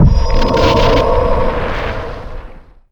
cast power within

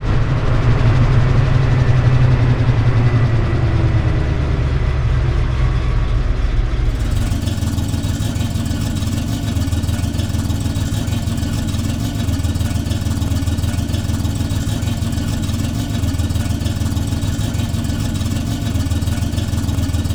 throttle off slow loop, limousine; vehicles; sligwolf

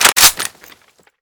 psg bolt pull; psg1; weapons

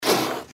gecko scream; npc

